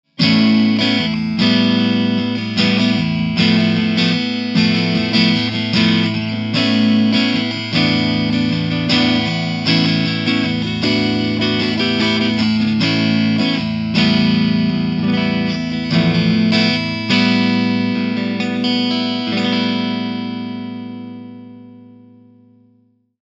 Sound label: Cleansound Position